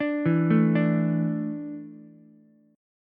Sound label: E 7th Suspended 4th, Ukulele; E7sus4 arpeggiated; 7,4,5,5; JGuitar